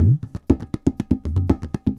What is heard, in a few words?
tabla 120